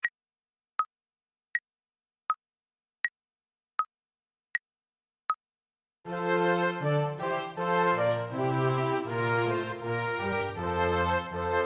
ocarina, Ocarina - Six Hole

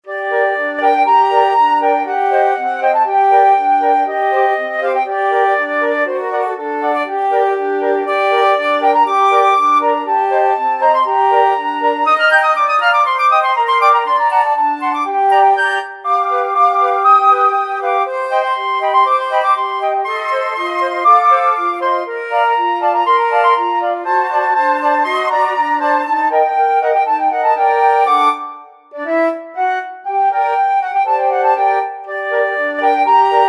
flute quartet

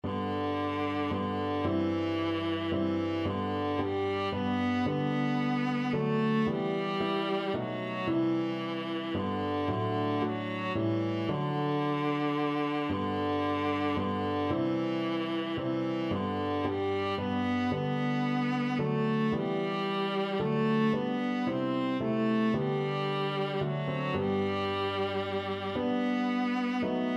viola

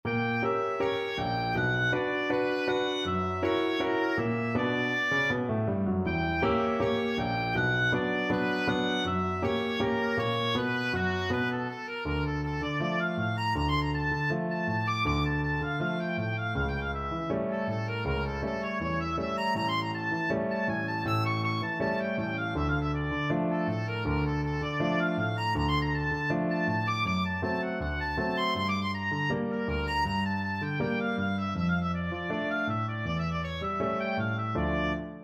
el choclo, oboe

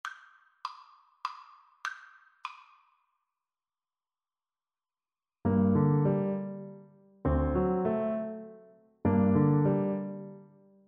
Baritone Saxophone

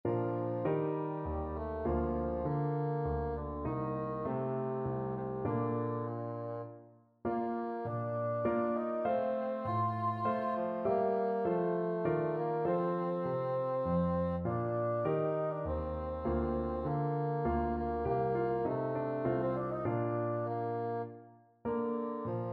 couperin sarabande, bassoon

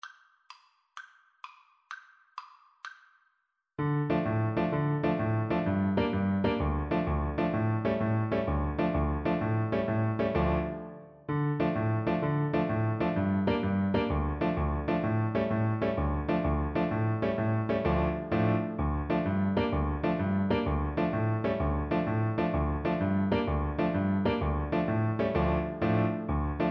tarantella, Tuba

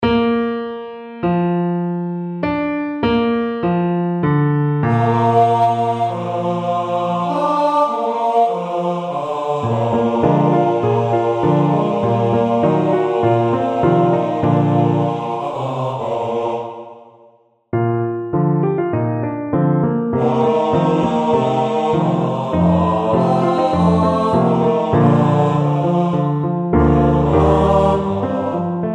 bass, bass voice; tuba mirum

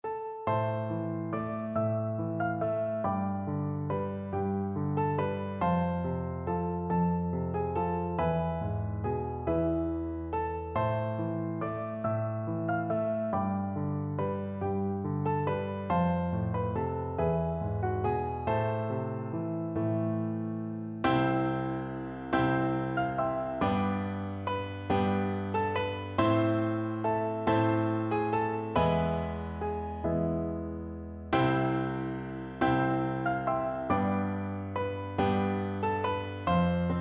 what child, piano duet

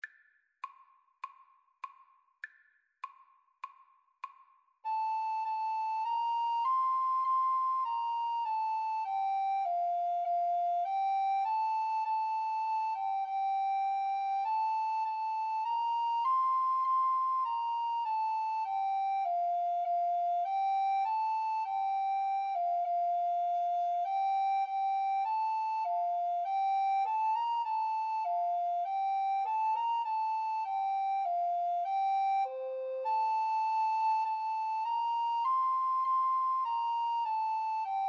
alto recorder, Alto Recorder Duet

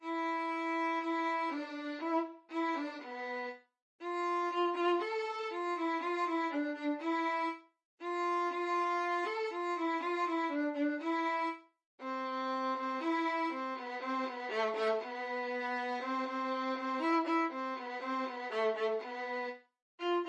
toryanse violin

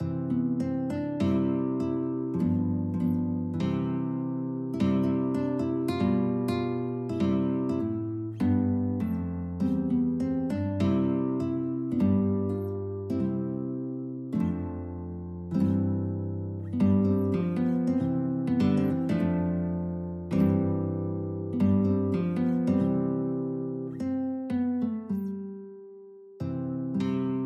South African Anthem duet, guitar duet

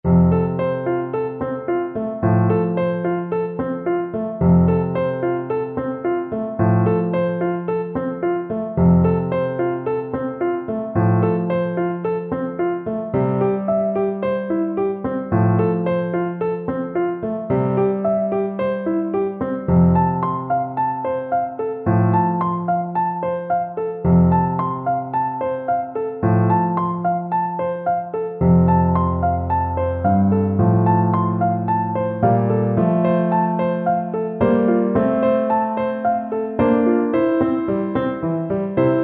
tue tue, Flute, Ghanaian Trad.

